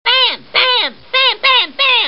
bam bam

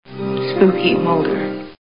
spooky

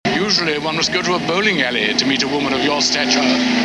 bowling

